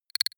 noise click metal; maschinen; signale; soundpool

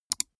gaming mouse left click single fast soft; maschinen; buero

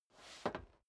step boots metal dry scuff; schritte

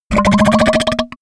goblin fart, comedy cartoon; soundpool

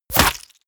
bullet small impact body flesh; krieg-waffen; geschoss

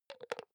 hygiene small cup close soft; haus-garten; badezimmer

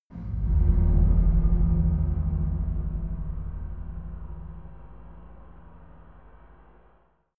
scary metal chime

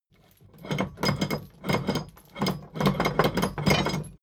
drawbridge winch hoist erratic; maschinen; metall; soundpool

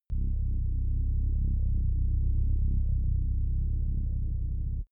sci-fi dark tension

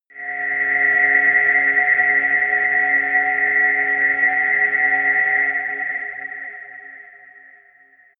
sci-fi quiet planet, drones

